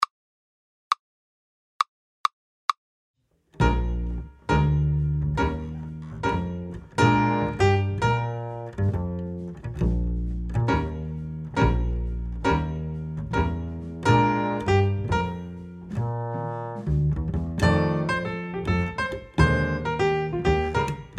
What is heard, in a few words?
Blues Slow Playalong